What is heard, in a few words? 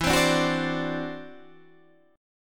E 7th Suspended 2nd Sharp 5th, E, down, Guitar, Open D